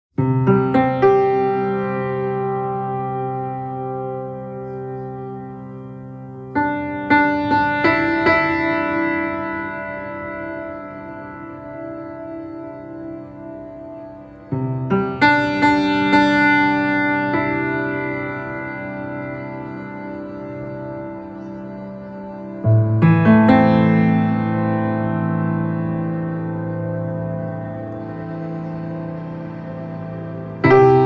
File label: piano and ambience